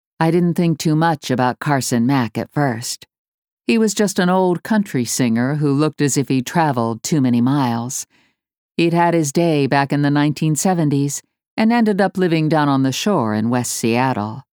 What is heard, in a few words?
Seattle Blues